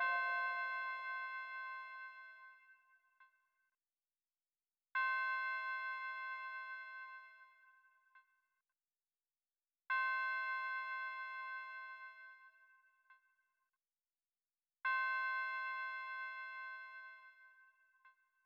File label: West Coast Melody Loop - 97 BPM A# Min Tubular Bells; Melodies; Loop Stems; Cymatics; Oracle Sample Pack